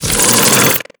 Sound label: attack growl, headcrab; creatures; vox